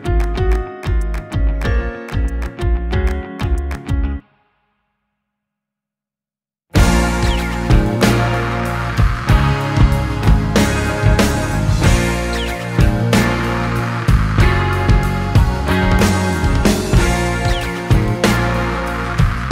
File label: Instrumental